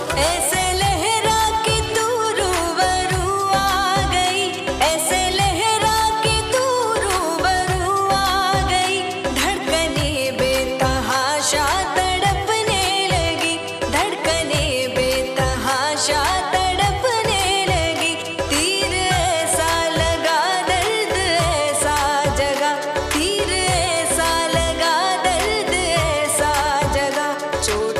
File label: Female Ringtone